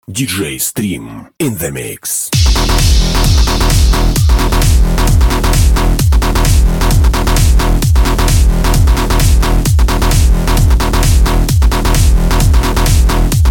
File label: electro bas